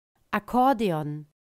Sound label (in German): akkordeon